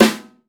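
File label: brush hard; Northstar - New Gold VOL-2; 30S BRUSH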